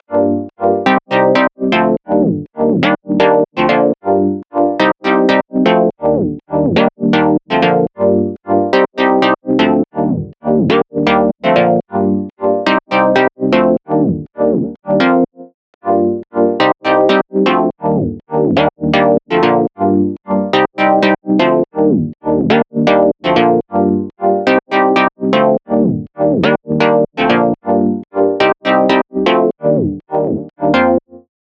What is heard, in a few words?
House Pluck Drop 122 BPM dry